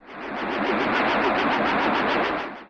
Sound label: Stinger Fly; Zelda; OOT